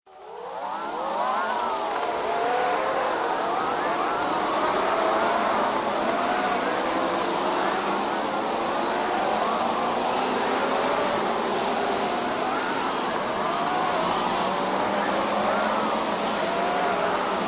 Created Ambience The Swarm, Artificial Sound Effects; Various Ambience created through sound manipulation in FMOD